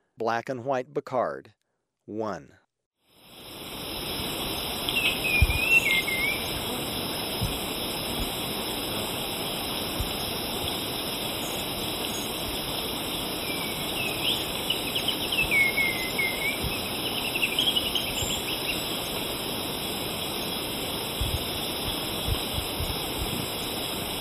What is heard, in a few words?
Black-And-White Becard, Birds of Ecuador; S. America